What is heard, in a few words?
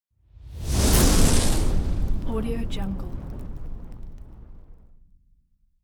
fire logo